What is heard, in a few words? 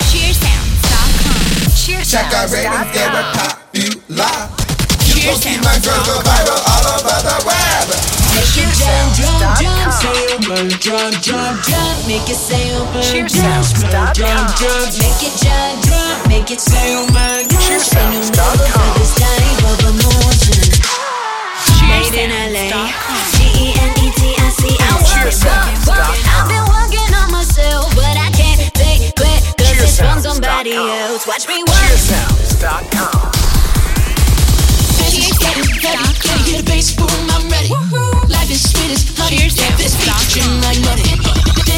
pop music theme